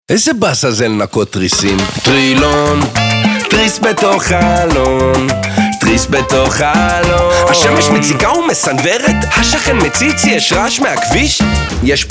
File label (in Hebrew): ג׳ינגל